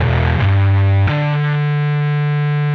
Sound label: Electric Bass; Basses